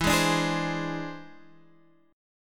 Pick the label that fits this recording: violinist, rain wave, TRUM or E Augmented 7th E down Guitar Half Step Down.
E Augmented 7th E down Guitar Half Step Down